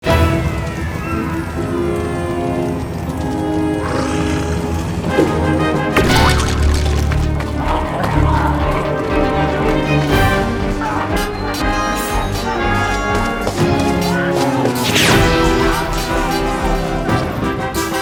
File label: Opening Animation Music Sfx Mix